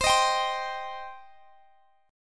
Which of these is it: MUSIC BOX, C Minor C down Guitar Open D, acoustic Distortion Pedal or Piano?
C Minor C down Guitar Open D